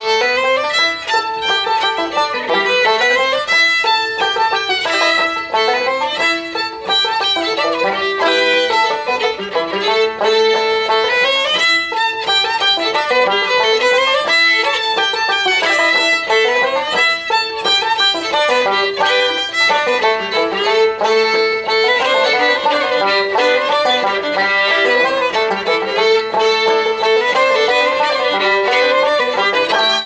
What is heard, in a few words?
British Field March, musicbox